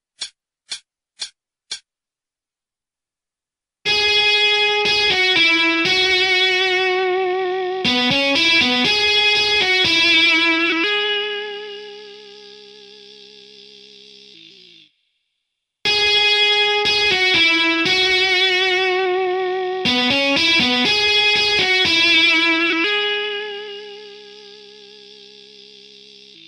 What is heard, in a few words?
intro 60